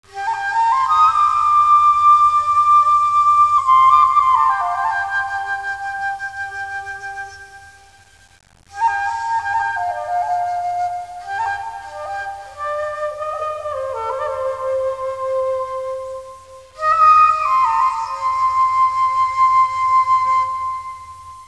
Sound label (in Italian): musica strumentale